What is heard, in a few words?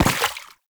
Step Water; Enemies; Foley; Footsteps; Warframe; Lasrian Soldiers; Lasrian Butcher